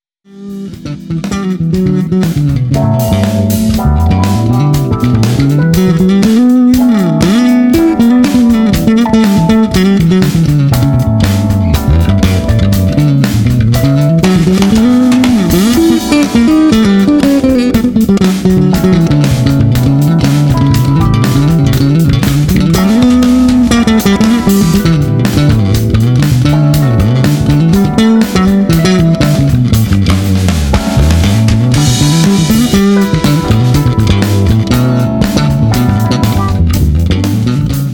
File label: solo funk; Acero occhiolinato